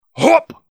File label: Jump; Versus Saxton Hale